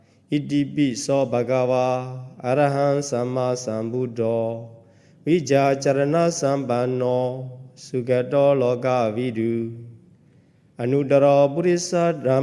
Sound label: Buddhānussati, Chanting; Pāli Version; Buddhānussati---Contemplation-of-the-Buddha; Paritta